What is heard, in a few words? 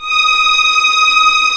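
D#6 tremolo, String; East West; The Ultimate String Collection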